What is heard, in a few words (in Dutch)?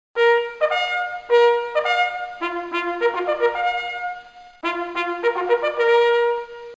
jachthoorn